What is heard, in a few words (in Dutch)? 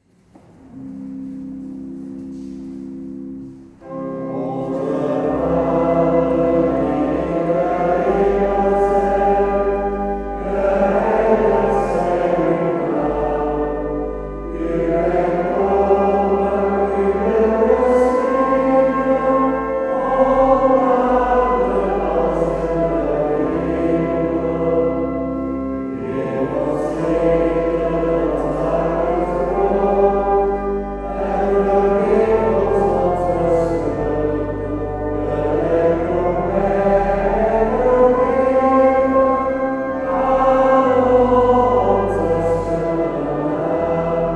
Onze Vader